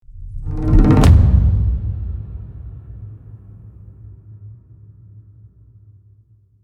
Tribal drum impact cinematic hit

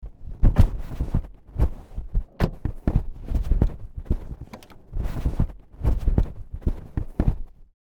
Car interior foley searching in compartments

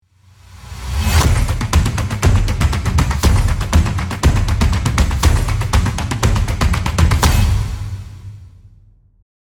Hype percussion ident logo podcast; logo animation

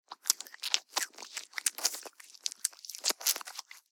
Hair gel paste rubbing in hands

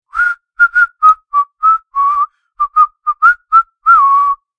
Man whistling a little song

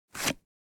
Wallet credit card sliding into slot; purse

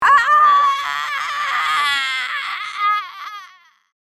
Werewolf pain scream female

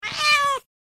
Distressed cat meow chirp; animal; gfxsounds

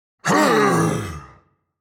Demon king lord pain damage hurt

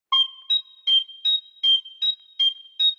Cartoon tiny walk

